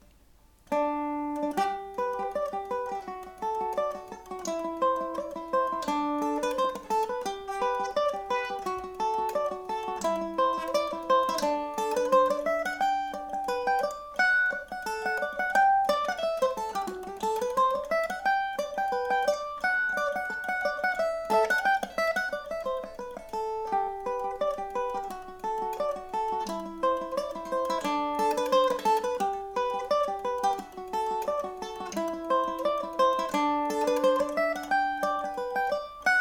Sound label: mandolin; parakeet